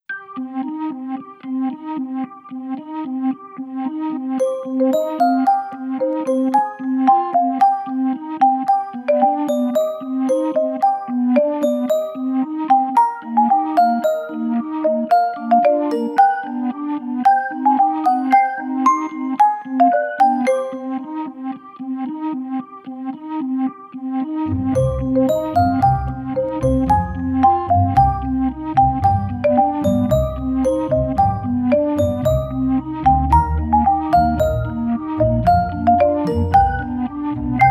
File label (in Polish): przasniczka akompaniament